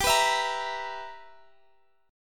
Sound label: B Major 7th Suspended 4th Sharp 5th G